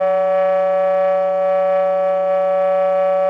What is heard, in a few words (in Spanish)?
Tremolos